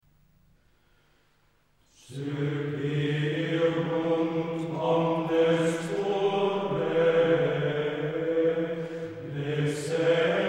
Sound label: Choralschola Coeperunt omnes, Gregorian chant